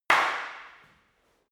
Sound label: staff wood fighting single